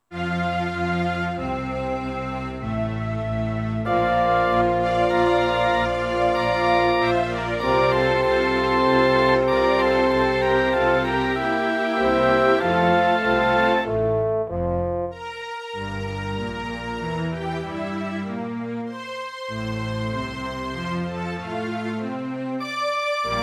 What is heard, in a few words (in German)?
ob konzert orchester; mozart